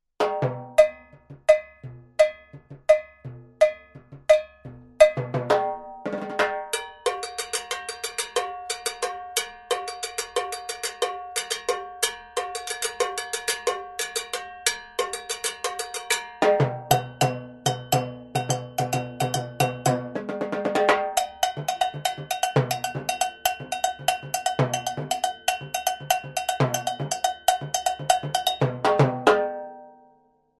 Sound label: LP BLACK BEAUTY COWBELL